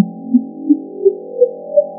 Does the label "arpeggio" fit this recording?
Yes